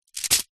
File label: envelope letter bill plastic windowed drop on carpet floor from letter slot; zapsplat